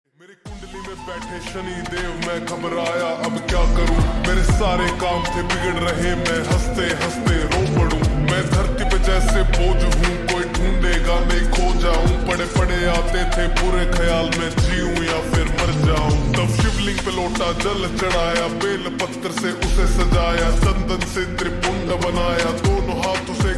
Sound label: Slowed Reverb Ringtone